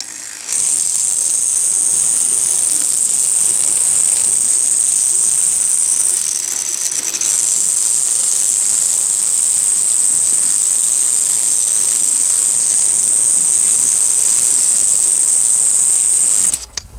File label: small wind up toy mechanism whirring